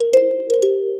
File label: very soft and gentle fail, descending tone